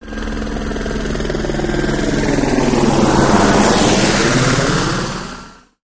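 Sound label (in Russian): вертолёт пролетает мимо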